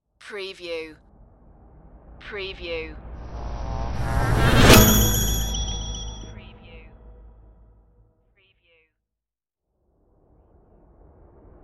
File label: weird whoosh impacts